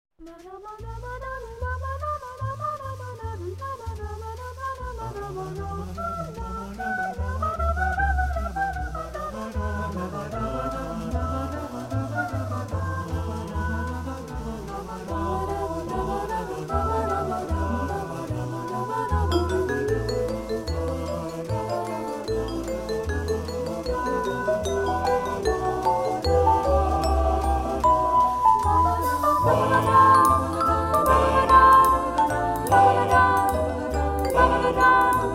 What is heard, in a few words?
Jazz